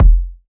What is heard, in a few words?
steel kick drum f key; kick drum samples